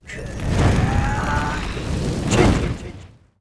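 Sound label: sorcerer effect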